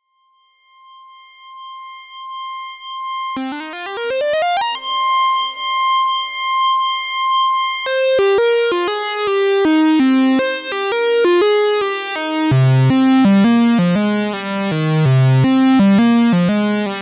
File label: Arturia MiniBrute2 Pulse Solo